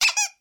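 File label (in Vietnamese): Tiếng bóp đồ chơi bằng nhựa cao su 1 tiếng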